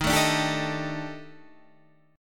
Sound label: D sharp Major 7th Flat 5th, down, chord